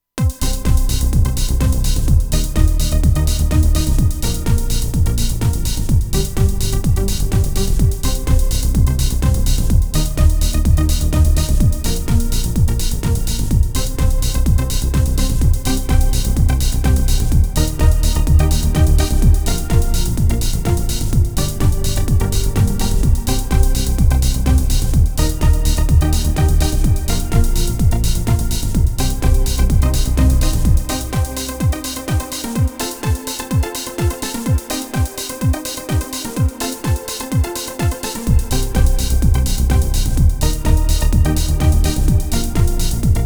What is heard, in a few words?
Techno Caper, action